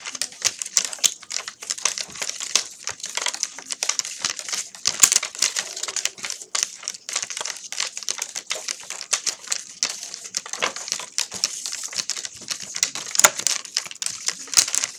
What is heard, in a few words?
papercrowd